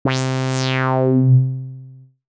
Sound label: Alchemy Res50 Cutoff Modulation SAW